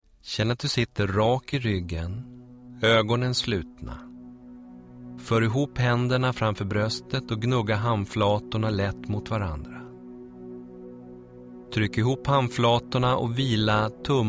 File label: Intoning